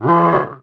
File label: damage; osiris; Fight; HitSound